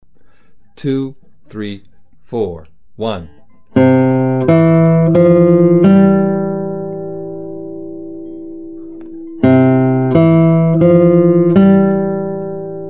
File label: When the Saints, Gitara